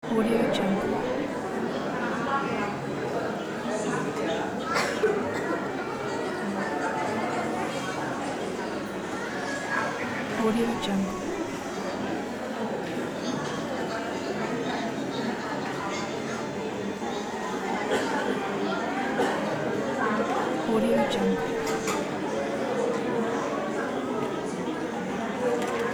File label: hospital background